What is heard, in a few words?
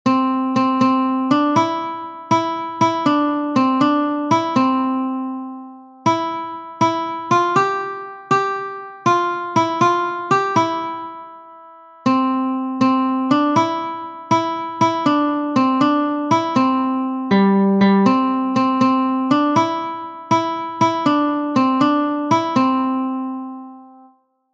Itsy Bitsy Spider Guitar Several Strings